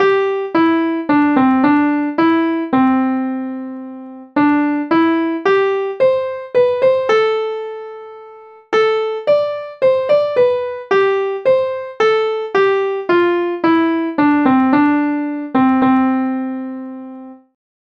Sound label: garelochside tune